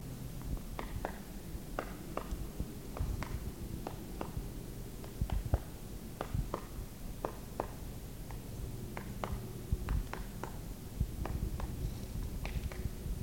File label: woody Tapping, birds